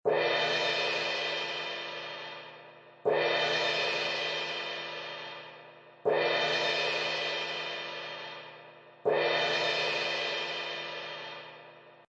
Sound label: orch cymbals, Orchestral Cymbals; Percussion Loops